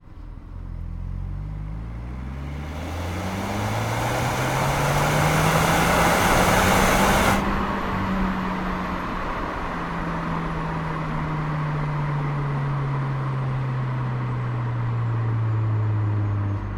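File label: Vehicle Car Audi Q2 ONBOARD Driving Sequence With Revs Up And Down EXHAUST RIGHT; MKH8040; Dramatic Cat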